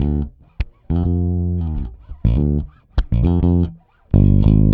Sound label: Rock, Bass; Bass X